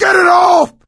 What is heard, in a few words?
scream while pounced, coach; voice; source engine; left 4 dead 2; survivor